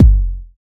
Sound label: subby bass drum single hit F sharp key